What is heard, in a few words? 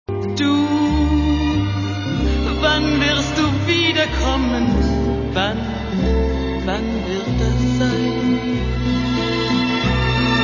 gitar